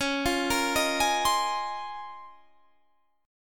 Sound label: A sharp Minor 11th